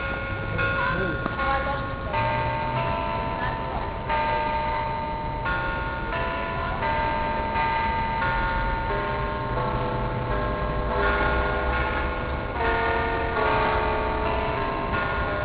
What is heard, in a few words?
clockchimes